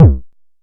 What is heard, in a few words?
analog quick, Kickbank